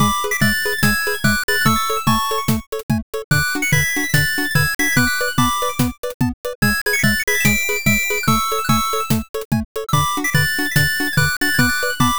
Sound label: bgm bright